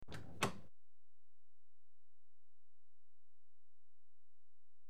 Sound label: Carriage Doors Open; BBC Sound Effects Library; Age Of Steam